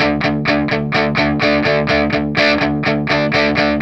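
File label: 125, Vintage Blues Guitar rhythm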